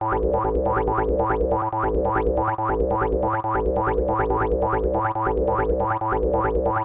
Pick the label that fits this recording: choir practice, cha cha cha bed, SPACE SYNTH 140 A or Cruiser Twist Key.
SPACE SYNTH 140 A